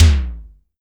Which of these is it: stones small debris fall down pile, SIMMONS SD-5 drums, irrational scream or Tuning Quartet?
SIMMONS SD-5 drums